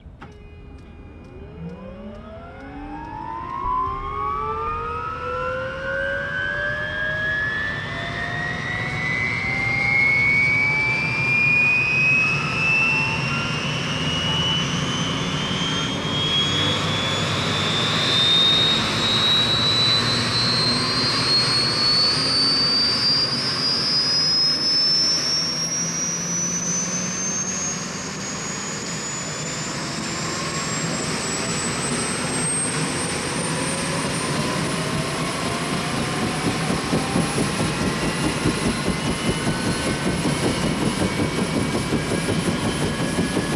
UH-1 Huey: Interior Start-up, Power-up To Idle. Good Blade Definition; Mono